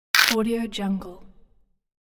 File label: ice cracking crisp sound